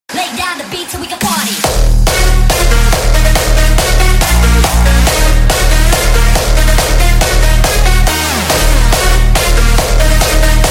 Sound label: edm